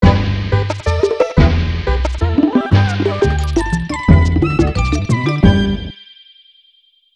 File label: Level Clear; Japan